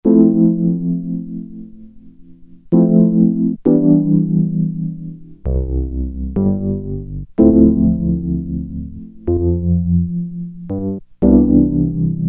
Keyboard - Electric Piano with tremolo, Crumar Trilogy